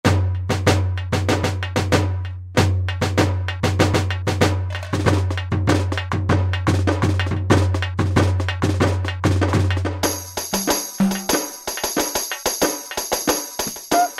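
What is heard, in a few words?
Brazilian Percussion; World; Latin; Roland SR-JV80-18 World Collection Latin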